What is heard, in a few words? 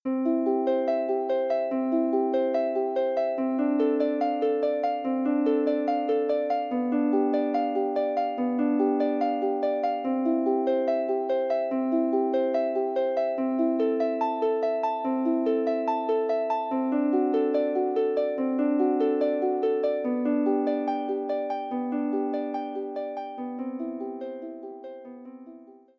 prelude, Harp; Forty Eight Preludes and Fugues Book 1